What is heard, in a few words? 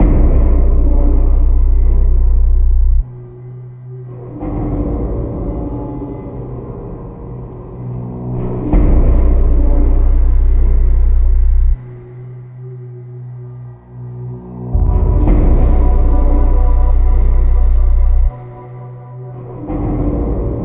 Loop